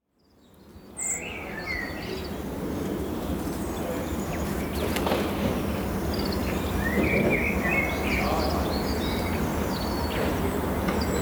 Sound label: Intro Ambience; Prince Of Glory